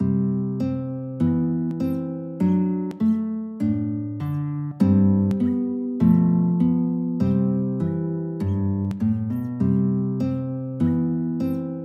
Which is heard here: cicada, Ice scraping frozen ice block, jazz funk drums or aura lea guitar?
aura lea guitar